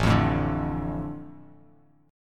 A Suspended 4th Sharp 5th down, chord; Asus4#5; strummed; JGuitar

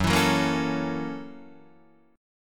Gb 7th Sharp 9th Gb Guitar Open D

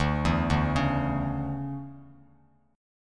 D Major 7th Suspended 2nd, Bass Standard; arpeggiated; JGuitar

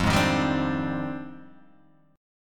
F Minor 13th, Guitar Open G

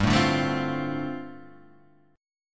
D-Minor 7th G, chord

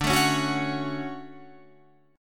D Major 7th, Guitar, Drop D

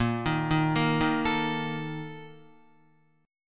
Bb Major 7th Suspended 4th, Guitar; arpeggiated; chordshape; 5,6,3,3,3,5; JGuitar

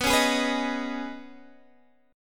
D sharp 7th Suspended 4th Sharp 5th B, Guitar

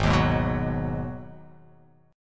G sharp Minor 7th G sharp; 4,6,6,4,6,x,x,x

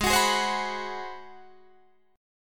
Ab 7th Sharp 9th Guitar Open D, chord

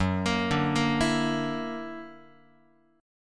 Gb Augmented 7th, down, Guitar; arpeggiated; 4A,4E,5A,5D,5G#,6C#

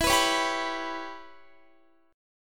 E Minor 6th E, Guitar; Em6; strummed; x,19,21,18,19,18

